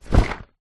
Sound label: household under bed storage box fabric set down carpet